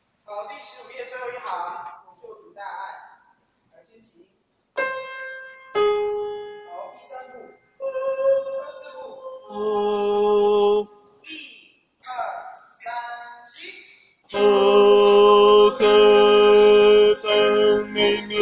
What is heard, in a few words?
bass